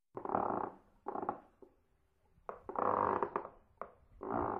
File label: creaking